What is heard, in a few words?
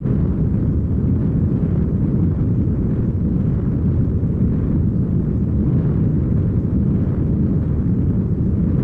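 hot blow, firestorm; Total Control - Action Cutz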